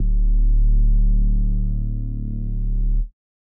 Reese D; Instrument Oneshots; 99Sounds; Monosounds

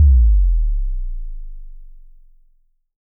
KCK, Roland SH-09 synth drums

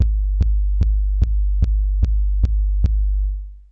Lo-Fi, Essential beats

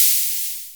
Crash, Korg Z1

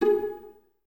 Violas G#4 Pizz; Miroslav Vitous; String Ensembles

